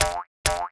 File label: Monkey Run; Seer; GamigoNA